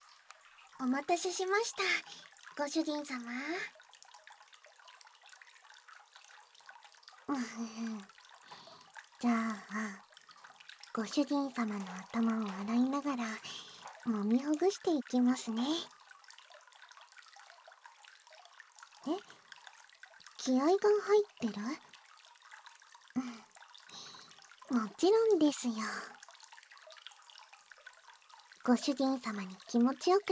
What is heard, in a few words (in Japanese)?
シャンプー・ヘッドマッサージ（バイノーラルマイク 効果音あり大きめ）, ASMR; 効果音大差分